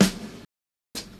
long room reverb snare single hit g key, Royality free snare drum tuned to the G n